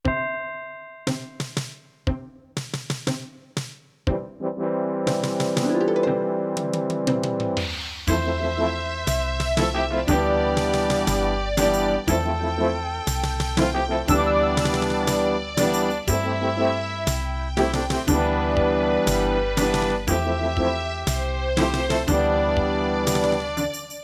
Sound Saurus; Runescape 08

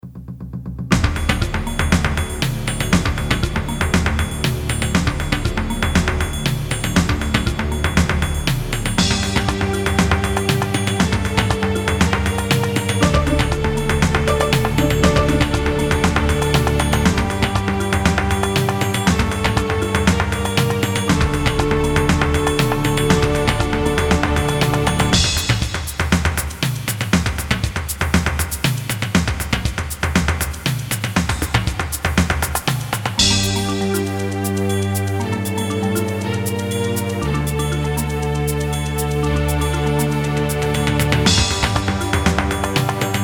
Casio GZ-50M; Age of Empires Opening

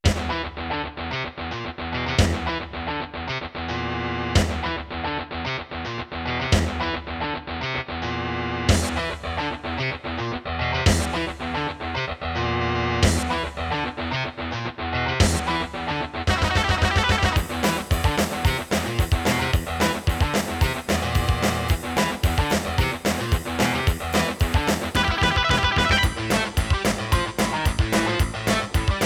Sound Saurus

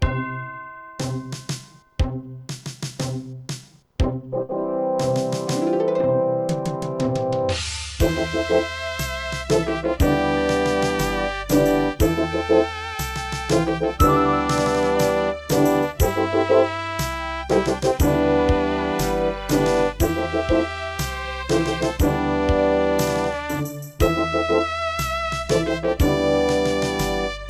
Pinnacle; Runescape 08

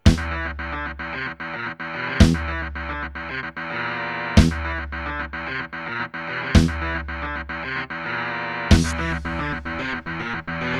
Pinnacle

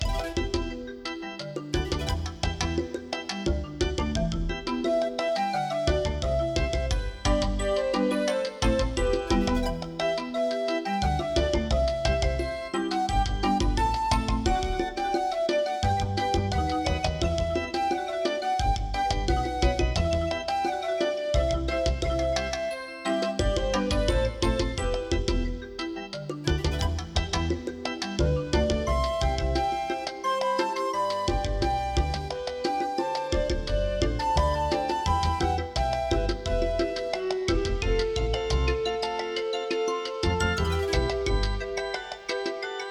AWE64 Gold; Monkey Island Intro

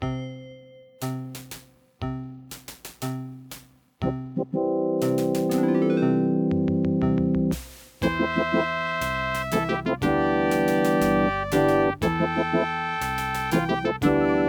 Aztec 2316A; Runescape 08

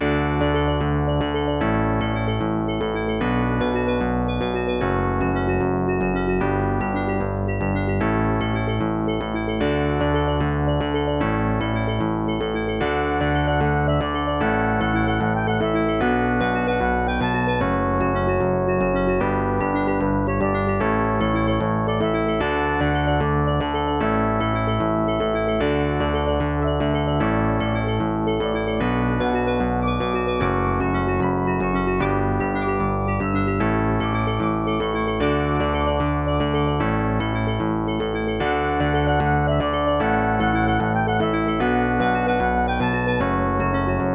Aztec 2316A; Lemmings Level 1

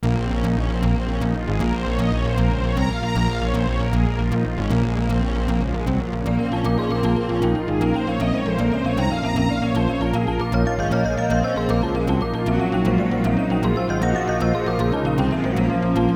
GUS PnP

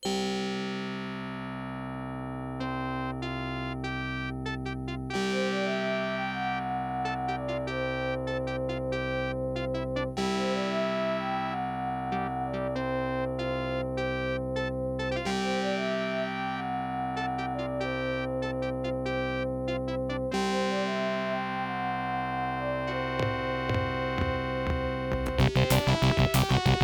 Vibra 16; Space Quest 3 Introduction

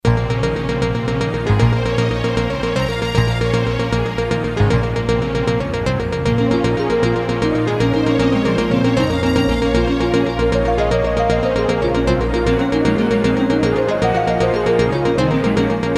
Casio GZ-50M

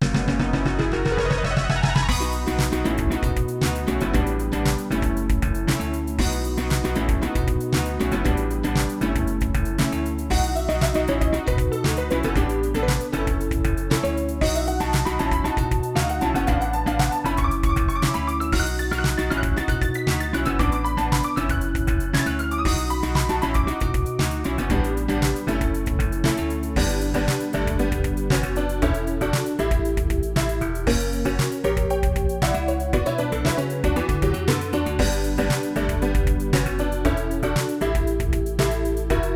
AW744L; Legend of Kyrandia 2 Dragonflight

